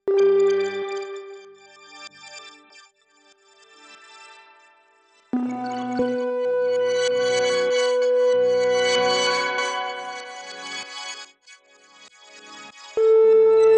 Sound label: Strymon Magneto shift repeats spring lfo